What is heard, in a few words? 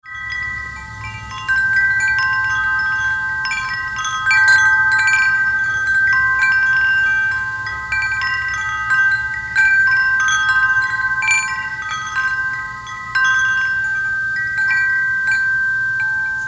Chimes; Morning Star